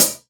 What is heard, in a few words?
Sabian Aax Hihat 14 Closed Powerful